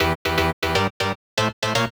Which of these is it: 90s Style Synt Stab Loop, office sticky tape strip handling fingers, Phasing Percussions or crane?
90s Style Synt Stab Loop